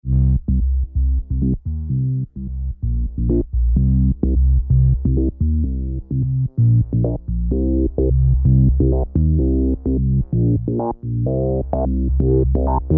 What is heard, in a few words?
bass arp